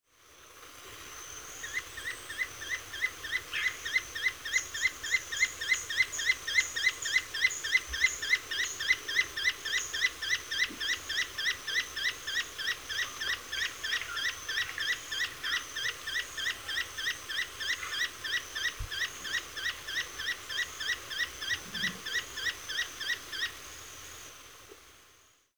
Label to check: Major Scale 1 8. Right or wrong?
Wrong